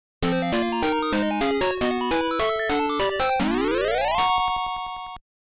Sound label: Stage Clear; Legend of Game Music Consumer Box; Power Strike II